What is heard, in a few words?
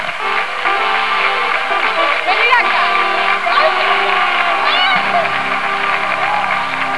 Bad Fanfare